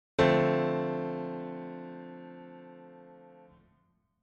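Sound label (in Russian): малый минорный септаккорд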